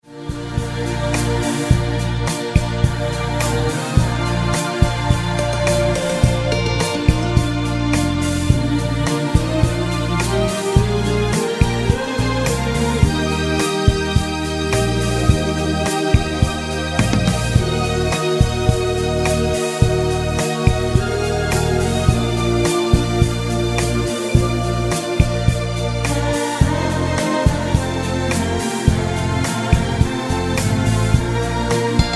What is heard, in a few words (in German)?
mit Chor